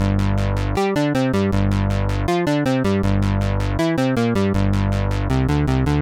Lead; PatchFactory; synthesizer

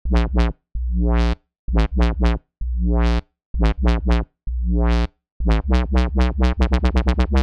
Bass

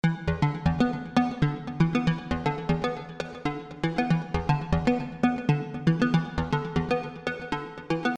Lead; Beyond

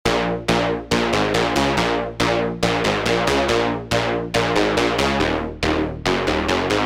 Lead; PatchFactory

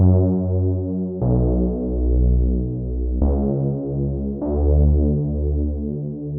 Bass; PatchFactory

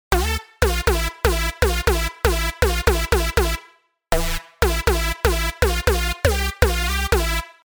Lead; PatchFactory

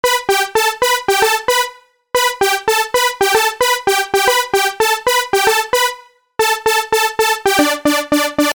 Lead

